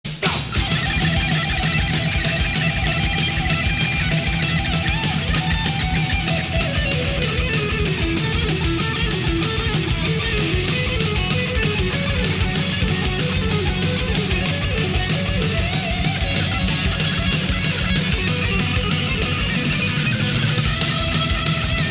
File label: solo